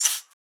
shaker; eddyflux

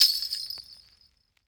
tamb; eddyflux

